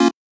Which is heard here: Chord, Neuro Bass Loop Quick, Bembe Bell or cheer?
Chord